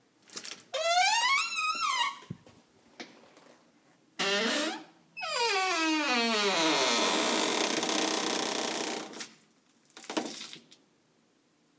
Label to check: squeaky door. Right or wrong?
Right